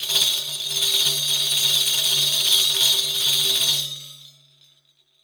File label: RIQ ROLL, HAND DRUMS; SoundBytes - Early Patches